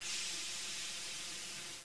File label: air zero, Train cl314; OpenBVE